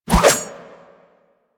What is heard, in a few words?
Pole Arm Spin Whoosh; Warframe